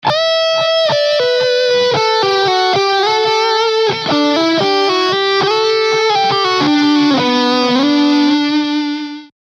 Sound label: Best Sustain; Combo3